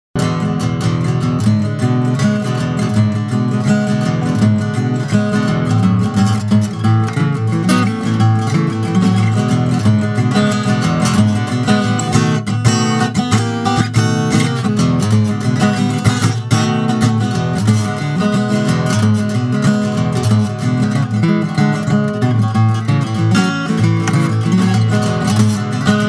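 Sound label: Guitar Sample 32c, Vintage vs Vintage style contemporary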